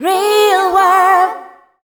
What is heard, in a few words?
FEMALE CHOIR, Dance Vocals